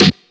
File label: clean acoustic snare sound g key; snare drum samples